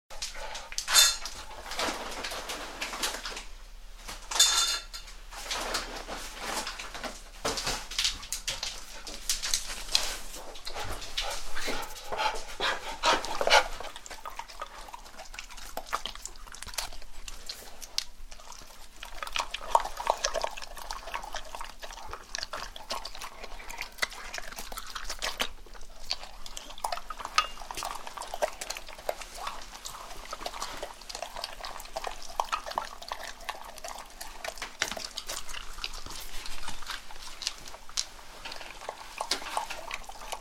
2 domestic hungry pet dogs feeding from bowls on the floor in a kitchen; Animals